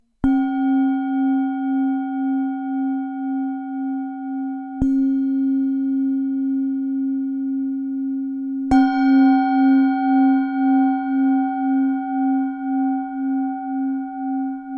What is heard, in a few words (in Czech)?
matna tibetska misa